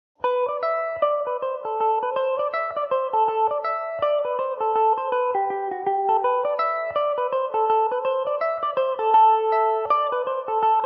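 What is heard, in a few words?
A dorian, Irish mandolin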